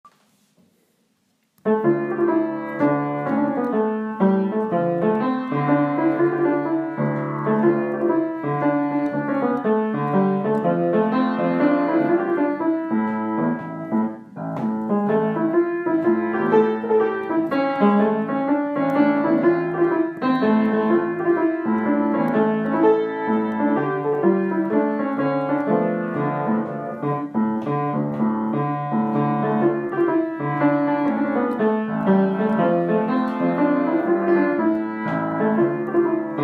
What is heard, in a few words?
Piano